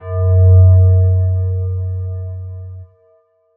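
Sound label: FM Synth Bass, F-2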